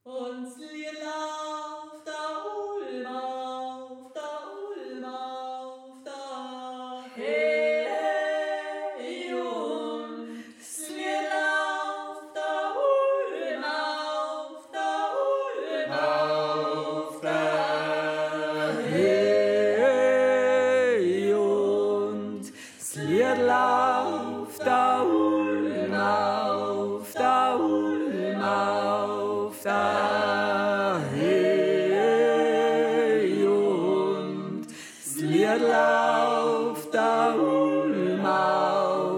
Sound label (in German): Tenor